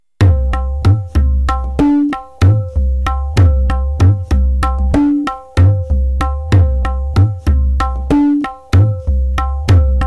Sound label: jhaptal drut 190bpm